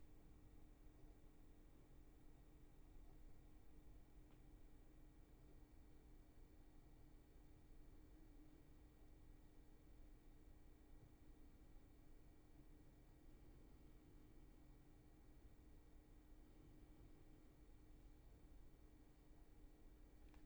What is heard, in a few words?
roomtone